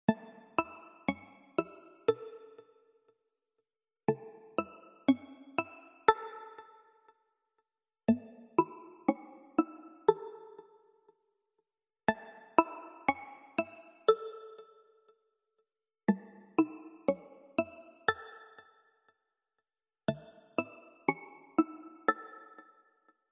plucky ringtone; iphone

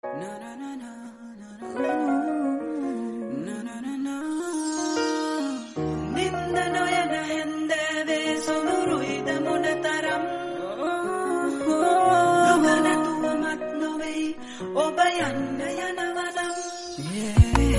srilankan ringtone

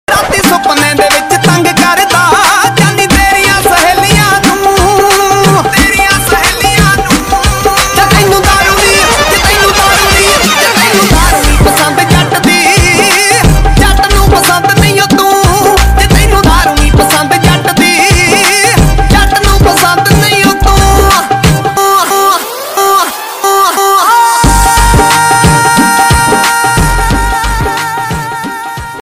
new punjabi ringtone

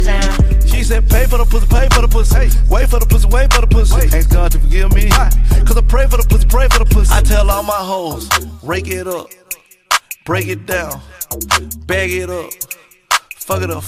new hip hop ringtone

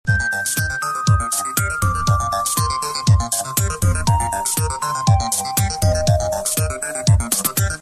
apple cool notification ringtone

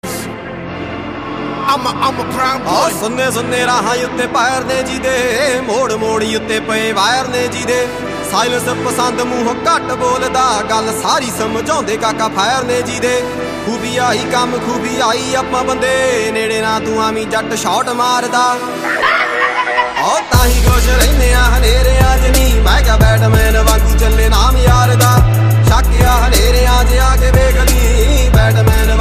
punjabi ringtone

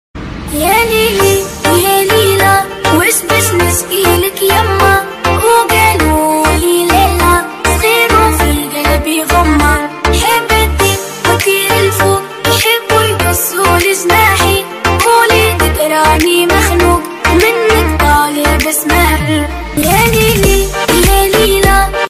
arabic ringtone

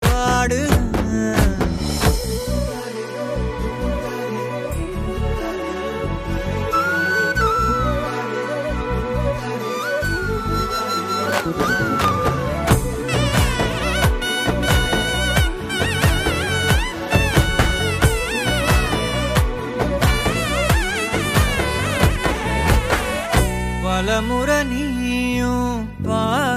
tamil ringtone

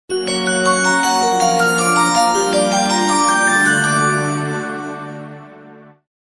magic sms tone ringtone

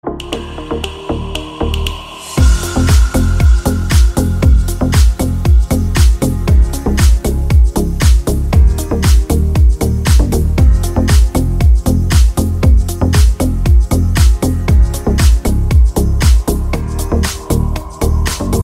romantic samsung s8 ringtone

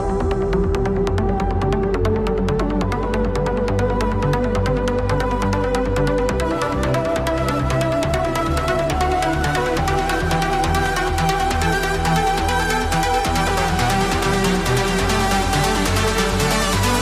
ringtone

